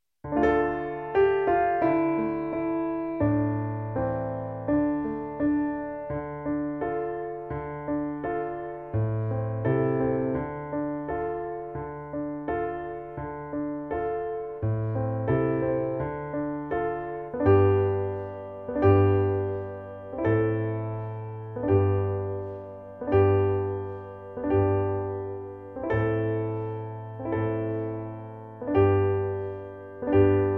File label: PIANO